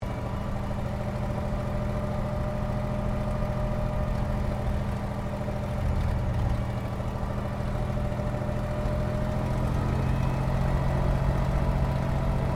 Aircraft P-40 Kittyhawk taxi Shutdown Interior, WW2 Kittyhawk or Warhawk fighter plane; 1940s; Airplanes